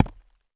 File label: Industry Tool Sledge Hammer Hit On Tree Stump Weak